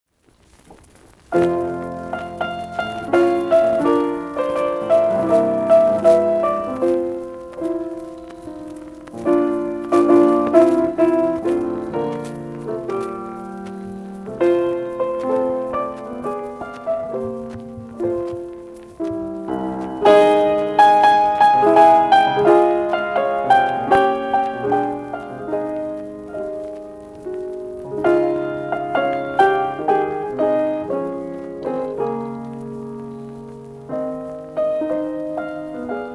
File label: piano e violin; Andres Gaos; Obras para piano e violin e piano; Nuevos Aires Galegos